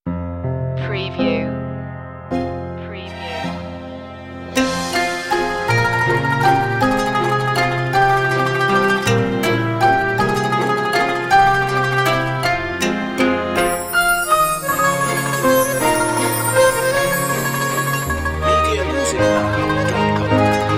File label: world valse no rhythm